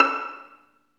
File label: STR Violin, Solo Violin FX